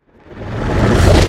音爆蓄力声; 监守者

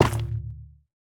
菌光体破坏声

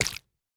蜂蜜块破坏声

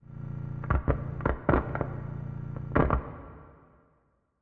诡异森林氛围声; ambient

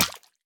鱼受伤声

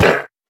羊驼受伤声

